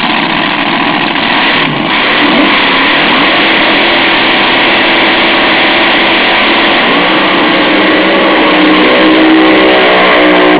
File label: drag run